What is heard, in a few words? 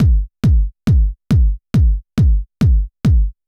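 bass drum, Techno 138 BPM; RED ALERT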